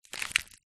leaf dry heavy scrunch up; foley

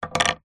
foley woggle wooden cub scout down on table; zapsplat

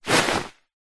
foley clothing shirt grab pick up

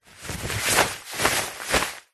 foley flag handling short movements; zapsplat

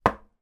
food large pepper mill place down on table hard

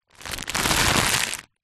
food potato chip large packet scrunch up; zapsplat

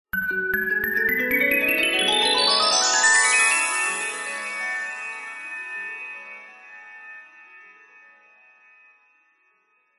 magic glissando reveal spell bright chimes

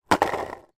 sport skateboard put down on street

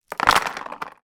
small plastic items place in small card box; zapsplat

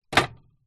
metal tool box down on surface; industrial; zapsplat

